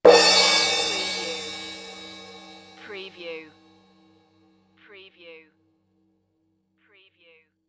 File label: Cartoon Drum Cymbal Crash